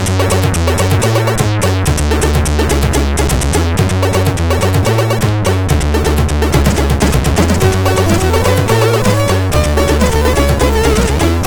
Press Start, CSG8580R5

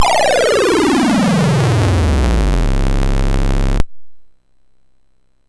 Dragonworld sid MOS6581R4

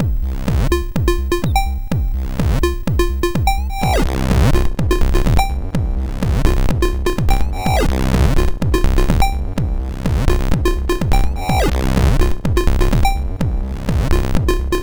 Tanz, MOS6581R2; Achtueng Tanz

